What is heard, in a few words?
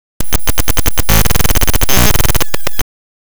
glitch